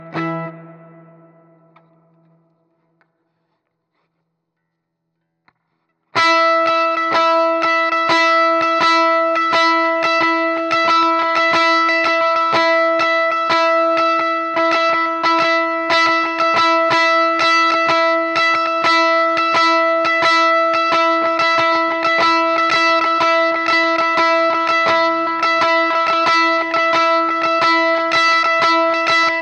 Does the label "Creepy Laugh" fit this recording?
No